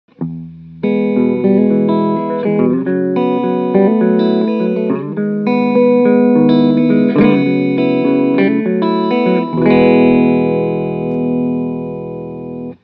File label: Clean